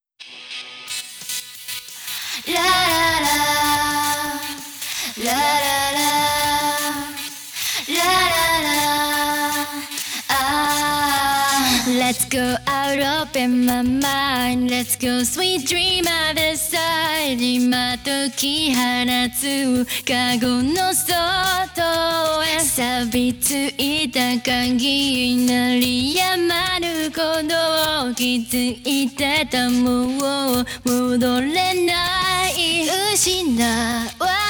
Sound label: Acapella; Gintama